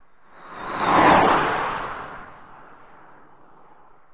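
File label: Car Fast By Highway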